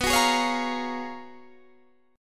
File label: Ab Minor 13th, B, guitar chord